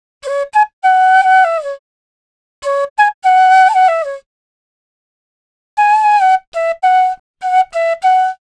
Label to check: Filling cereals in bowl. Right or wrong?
Wrong